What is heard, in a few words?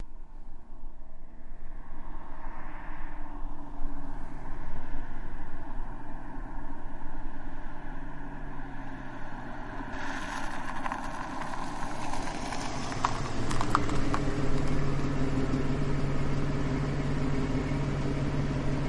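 Mustang Idle Loop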